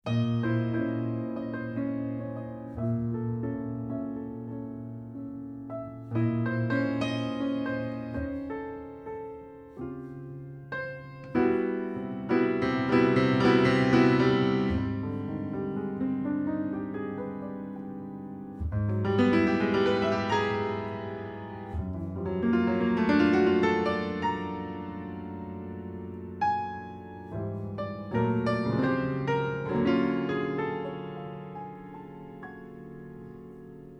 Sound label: Waltz